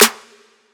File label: sparkly snare sample g sharp key, snare drum tuned to the G# note; snare drum samples